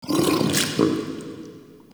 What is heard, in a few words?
Interior Munching, Infested, Ambience; Misc Indoor